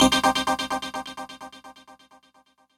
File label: Chicago House Piano